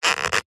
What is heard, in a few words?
foley plastic squeak creak tighten turn; zapsplat